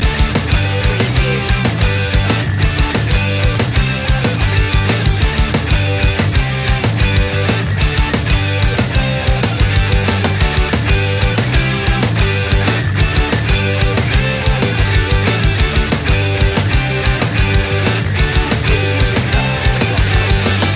american summer loop

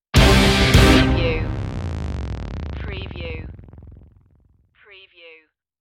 drama heavy stinger

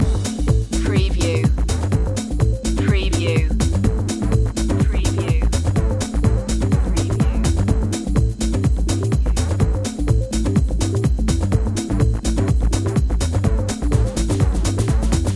pulse line loop

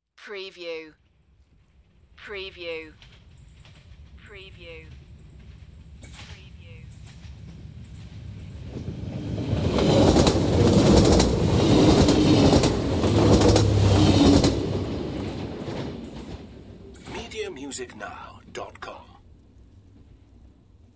Train Passing By Fast

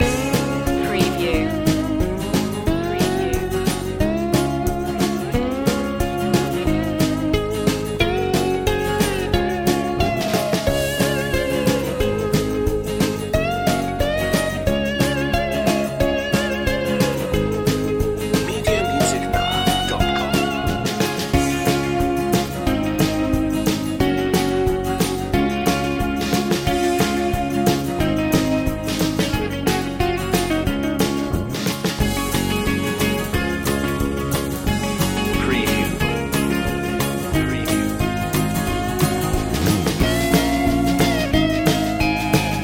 back home loop

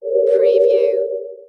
Amb oldtime

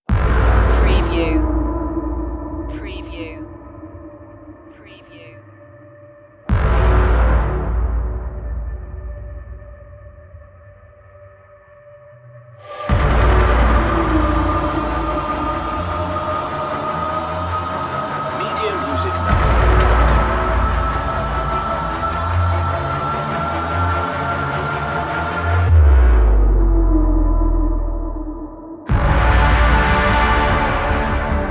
apocalypse day no drums

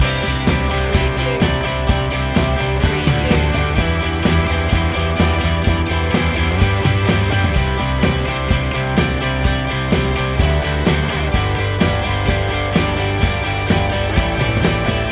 driving force loop

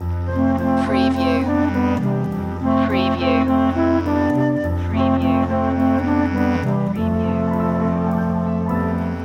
loop

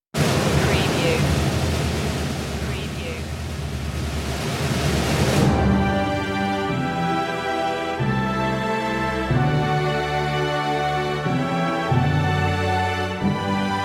god save the king very slow no choir

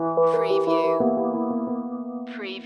Hip Hop Dark Rhodes Licks 90 BPM Straight - B

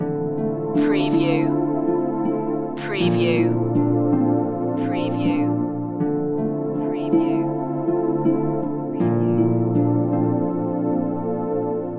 slow angels loop

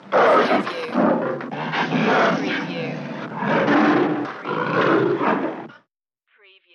Leopard Growl Angry

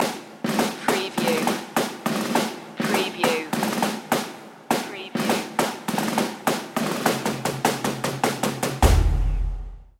tattoo drumline

